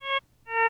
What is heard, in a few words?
Advisory, alarms